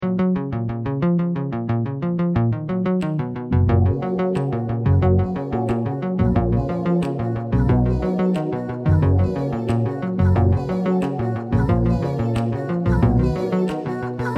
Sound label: Synth